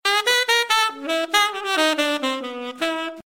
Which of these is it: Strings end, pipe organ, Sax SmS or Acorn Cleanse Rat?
Sax SmS